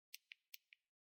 スマホの音量ボタンの音

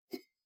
タンブラーを取る音

